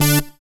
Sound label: SYN DANCE; Sound & Vision - Gigapack II